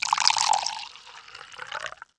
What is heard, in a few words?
POUR LIQUID